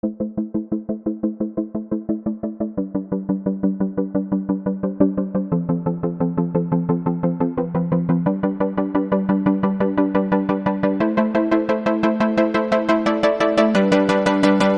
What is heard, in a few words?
DnB